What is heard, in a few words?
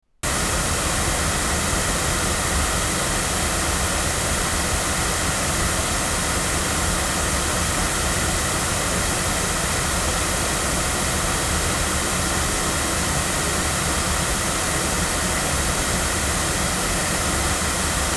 loudspeakers; Lauten Oceanus; cardioid; microphones